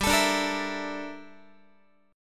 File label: G Minor 6th Add 9th G down Guitar; Gm6add9; strummed; x,15,12,12,14,15